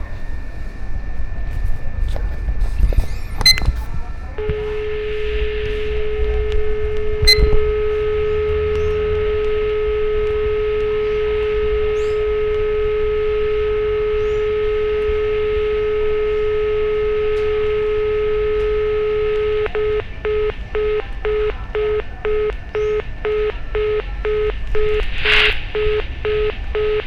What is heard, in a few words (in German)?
Telefon, Brasilien